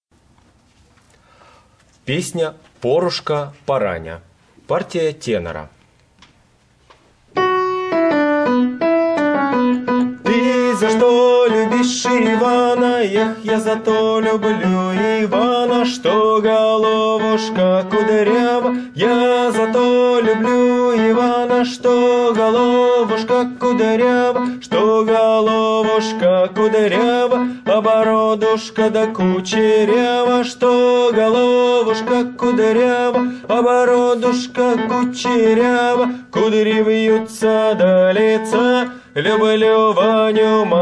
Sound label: tenor; Porushka porania